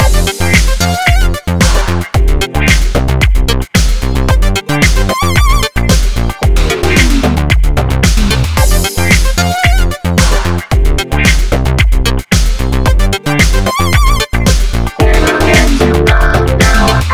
Discostep Combi Loops; G 112; From 1980s2

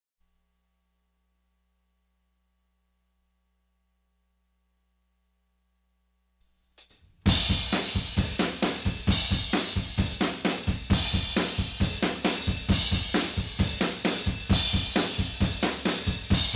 drums

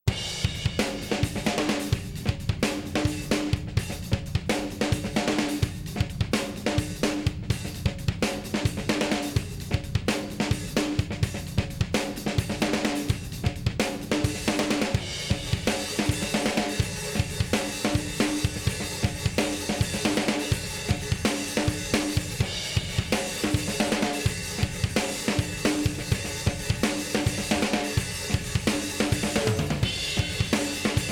verse drums stem

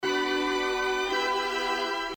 Strings

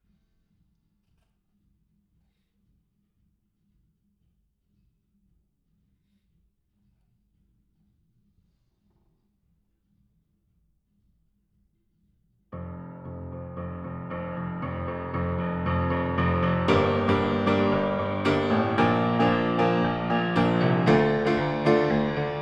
Piano

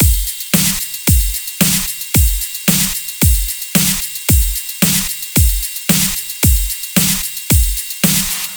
112, Discostep Drum Loops; Rocker

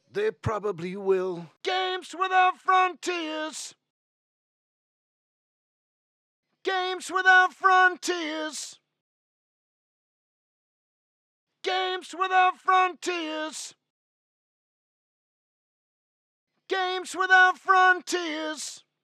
vocal; Summer X Games

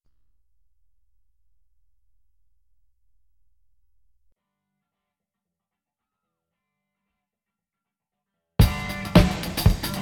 drums

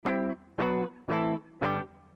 Guitar

